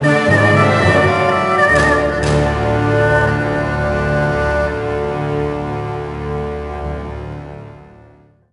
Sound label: Sting Combat Defeat